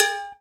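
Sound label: PERCUSSION COW, Cowbells; Roland L-CD701; PRC Latin 1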